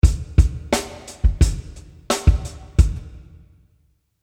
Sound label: drums tight room